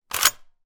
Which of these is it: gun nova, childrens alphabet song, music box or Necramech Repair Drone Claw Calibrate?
gun nova